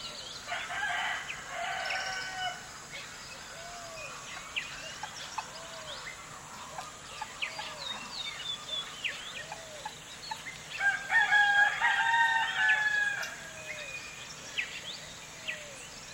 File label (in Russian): krik petuha i penie ptic rano utrom v derevne